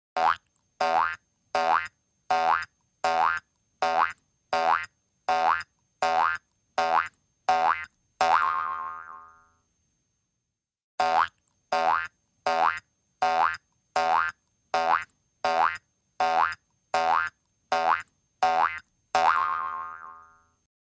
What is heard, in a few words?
Cartoon Hopping